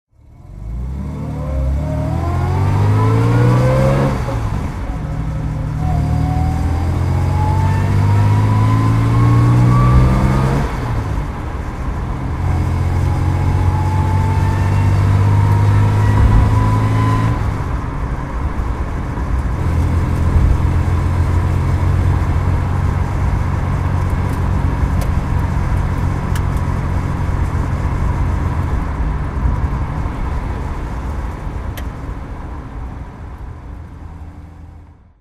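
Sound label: jaguar xk150 34L driving, Engine sound driving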